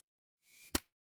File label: cartoon stretch